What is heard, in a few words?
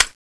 box in; cso; skull6